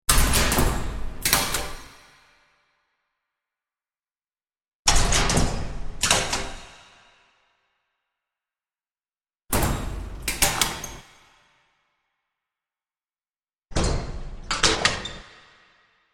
Metal Door, Metal door closing and locking sounds .; Metal Doors (Sound Effects:Doors); Metal Door Pack